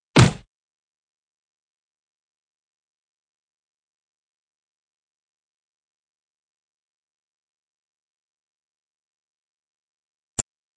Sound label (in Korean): Fights, 싸움-전투; 헐리웃특수효과음